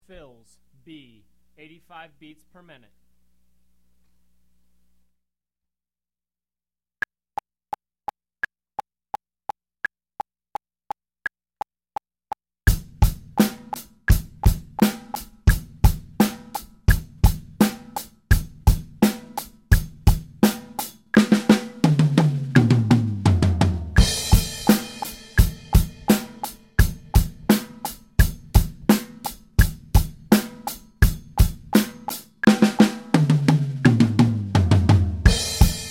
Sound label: fills, Beginner Fills 85BPM; Drum Lessons